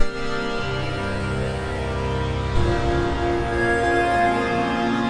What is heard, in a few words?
094 chronic-synth-sweeper, synth and electronic loops